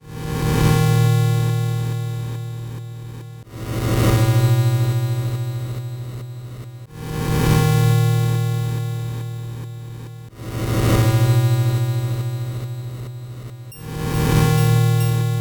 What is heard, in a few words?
8bit bgm slow